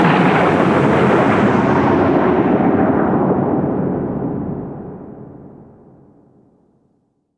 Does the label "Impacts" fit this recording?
Yes